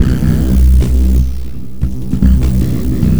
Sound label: NEW WAVE BEAT; Big Beat Samples & More; AKAI; ALL LOOP KIT